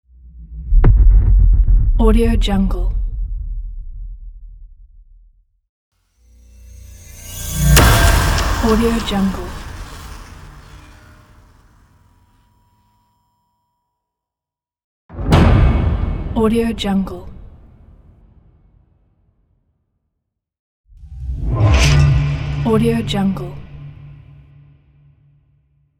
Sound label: powerful risers sound pack